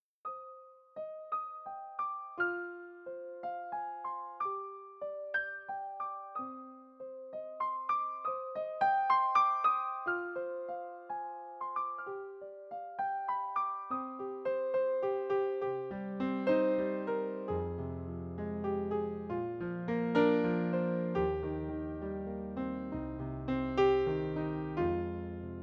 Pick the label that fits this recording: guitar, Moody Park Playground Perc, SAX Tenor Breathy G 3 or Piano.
Piano